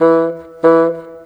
Bassoon, Orchestral, Rock Pop

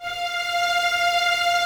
violins F 5, String Ensembles, 23 Violins; Miroslav Vitous

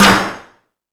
More Toms, Drum Machines

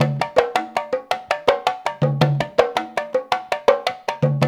93, African Contemporary; Spectrasonics; Supreme Beats; Nongo

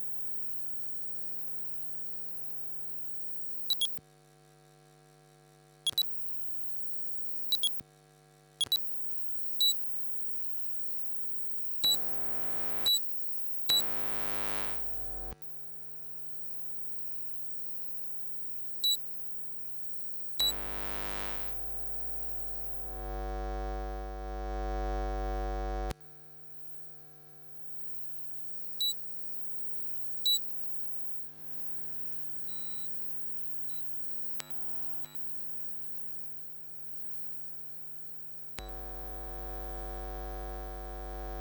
Electromagnetic Field, Electro; electric fields

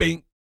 BASS FX; ILIO; Vocal Planet VOL-3; Jazz & FX

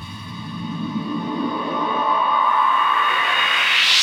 Sweeps Up, Effects

